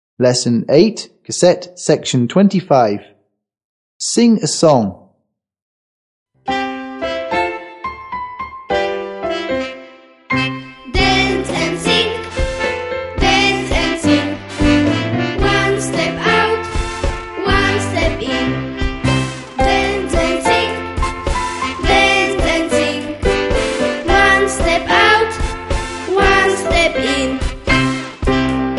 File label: SONG; Busy Bee Starter; DANCE AND SING